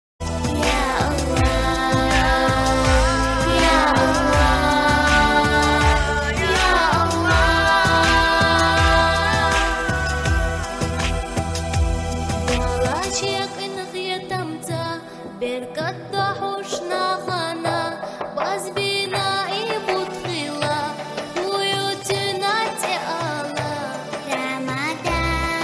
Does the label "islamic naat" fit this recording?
Yes